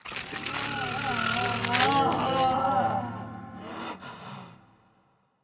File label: Spider To Human; The Inn; Zara